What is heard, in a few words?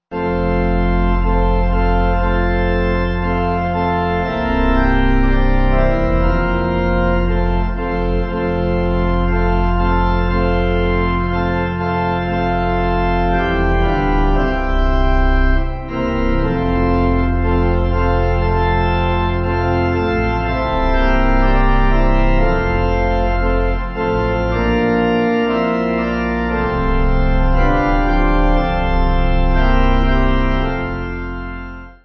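Pipe Organ, Music Backing Files for Churches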